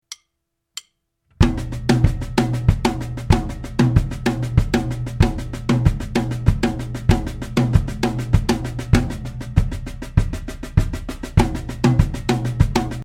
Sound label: Fill Using Odd Note Grouping, jazz drumset